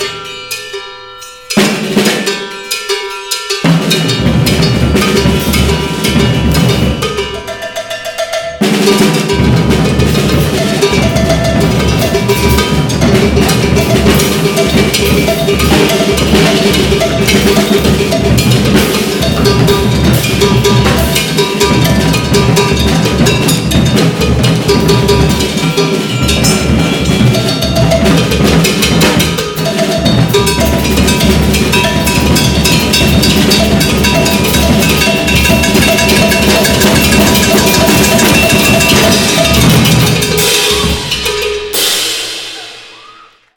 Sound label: Drums